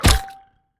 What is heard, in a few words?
fist hit; smite; god ganesha skin11